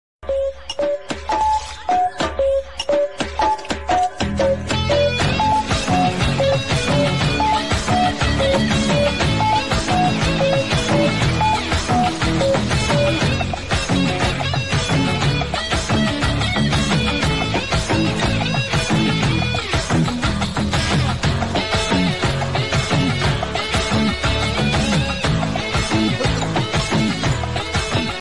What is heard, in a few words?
movie ringtone; theeratha vilayattu pillai